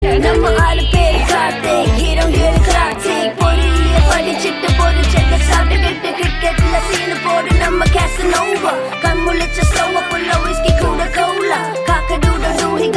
Hip Hop Ringtone; Tamil

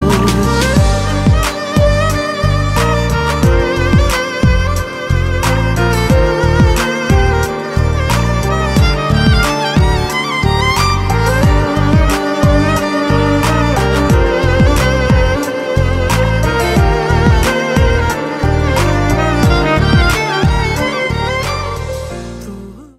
Instrumental

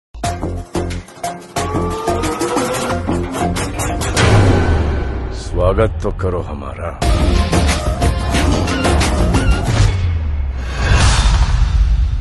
Motion Poster Trailer Ringtone; Dabangg 3

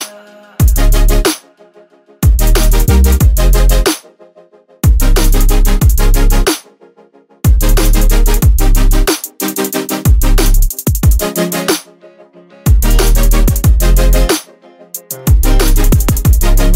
Instrumental

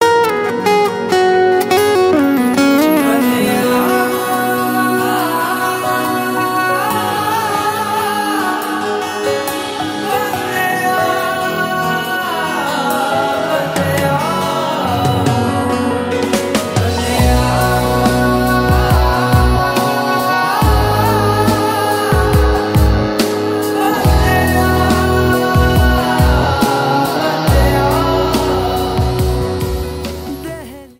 Humming Tone

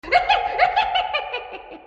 Joker Laugh Message; Ashok Galla; HERO Teaser; Telugu

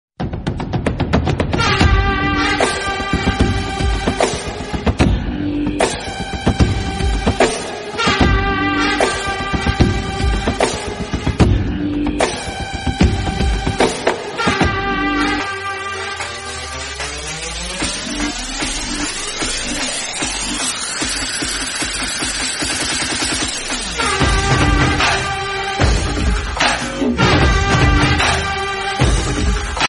Instrumental mass Bgm; Policeodu; Telugu

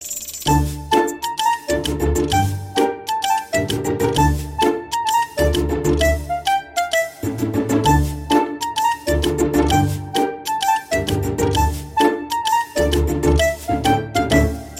Love BGM; Putham Pudhu Kaalai; Tamil

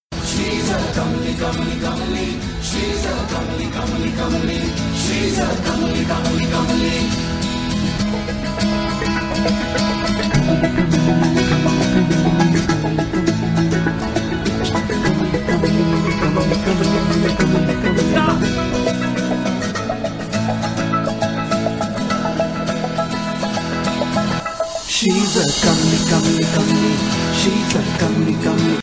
Intro Bgm; Aaliya; Dhoom 3; Telugu

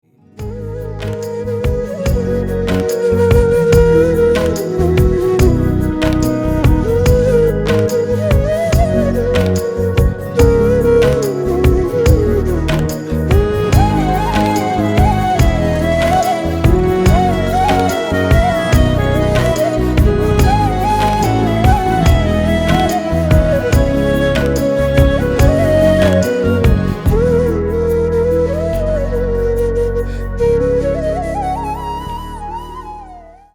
Instrumental

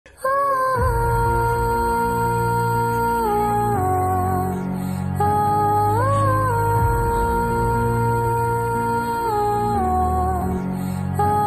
Emotional BGM; KGF 2; Malayalam

